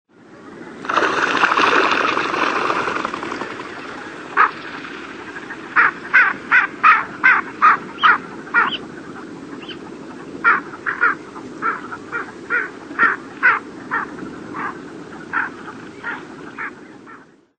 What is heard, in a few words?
Greater Scaup; Birds